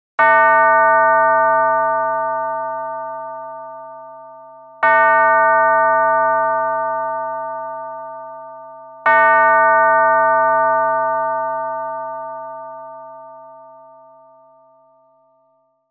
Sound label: alternative; musicfox